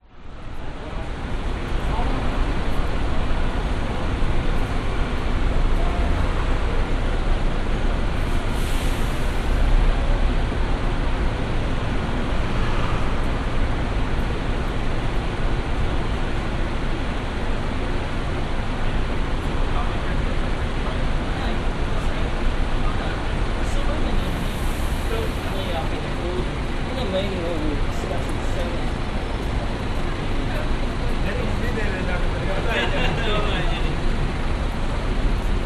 brisbane cbd near bus stop traffic people buses approach stop away binaural